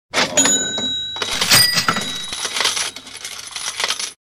cha ching money sound

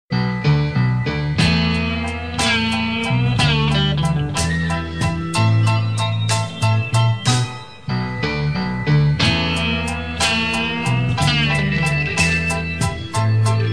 Exotic Guitar

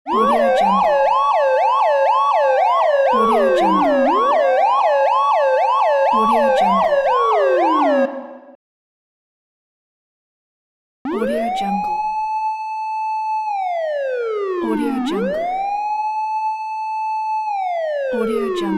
police sirens

